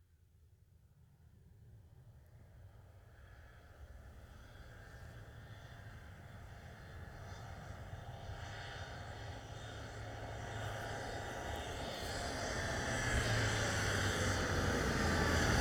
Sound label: Ambulance Approach And Stop, Idle; Emergency; BBC Sound Effects Library